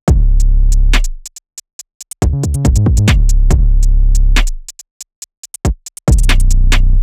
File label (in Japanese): 808ベース